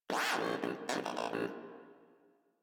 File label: Moa aggressive vocal greeting; Warframe